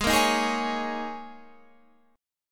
G sharp Suspended 2nd Suspended 4th G sharp Guitar Standard; strummed; 16,13,13,13,14,16